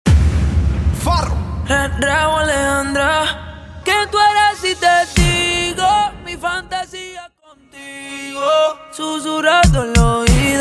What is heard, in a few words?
Open Show 94bpm